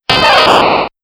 pikachu cry old gen; Pokemon Red Blue; gamesoundopedia

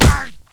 hit; mount blade

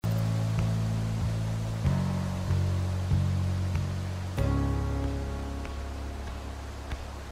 bg music; The Cat Lady

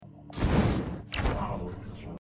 double jump; risk of rain 2

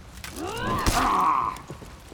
heavy damage; for honor

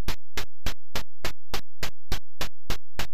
stairs; kung fu master

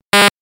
pickup; kirby s dream land

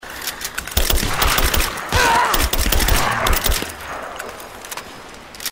shooting automatic; rise of the tomb raider; lara

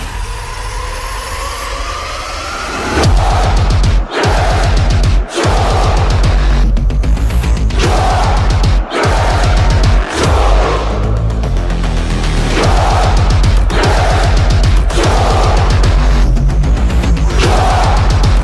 music gladiator; Doom Eternal

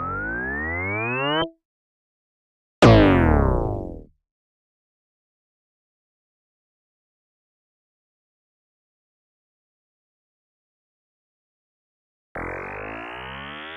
big laser charge; duck game

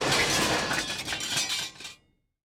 swords; pineview drive

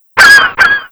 seagulls; Pinocchio 1995 SNES; gamesoundopedia

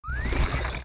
camo change; Metal Gear Solid 4; gamesoundopedia

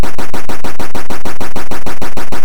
helikopter repeat; commando

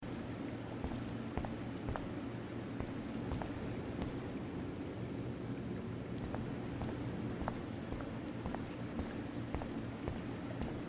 pool night sound; life is strange

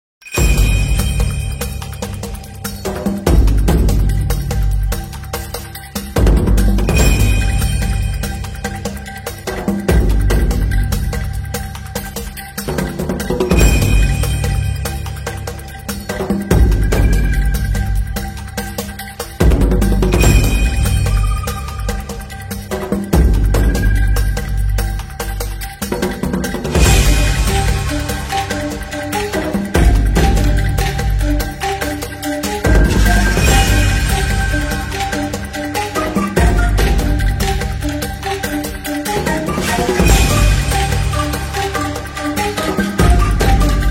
boss battle theme; kena bridge of spirits

